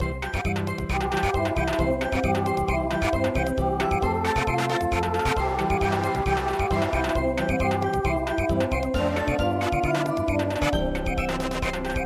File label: Samba